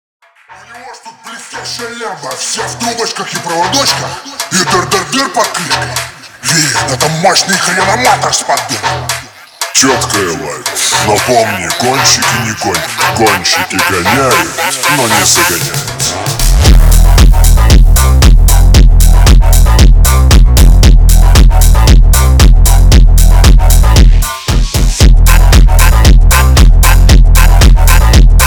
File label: brazilian funk